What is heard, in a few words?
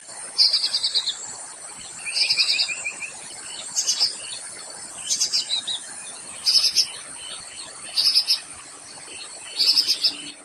Spiderhunter Grey Breasted juvenile calls, Bukit Tinggi Pahang Malaysia